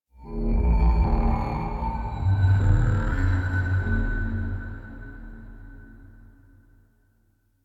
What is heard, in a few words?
Tech Texture Large, Ambience; Warframe; Cephalon Weave; DE